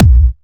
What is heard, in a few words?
Bassdrums Clubby Kicks; Vengeance Samples